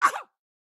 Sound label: cyclers hit reaction; Blind Drive